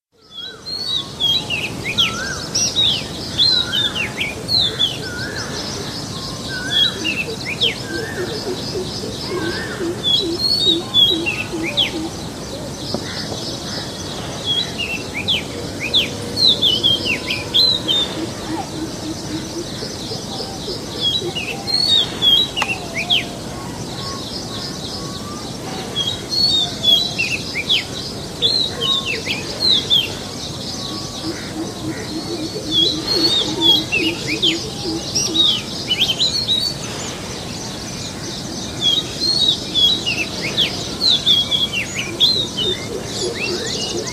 suara kacer hutan

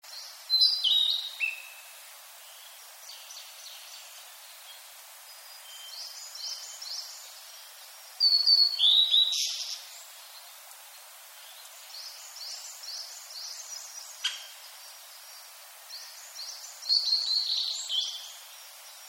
tledekan laut

